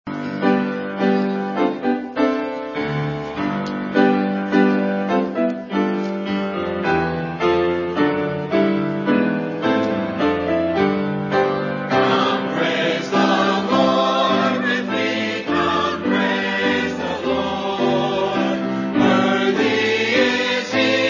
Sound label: choir